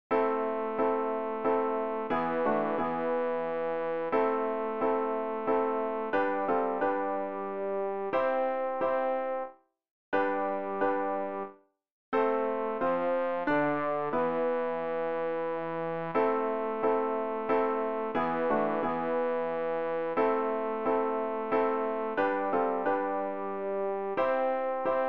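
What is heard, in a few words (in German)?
bass, Übehilfen; Ref. Gesangbuch; RG 073: Laudate Dominum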